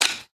agricultural tower harvesting, factorio sound entity